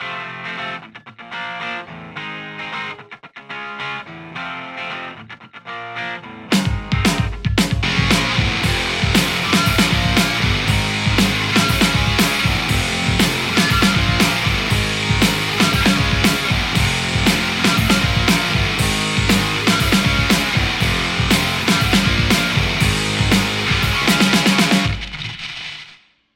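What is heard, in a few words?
Telecaster; amplistortion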